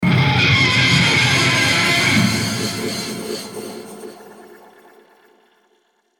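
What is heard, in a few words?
Musical Element, Drum Snare March; Blastwave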